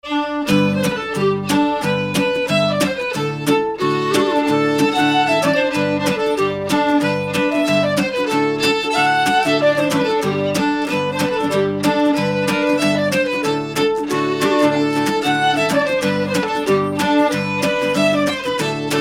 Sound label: hopsa danish trio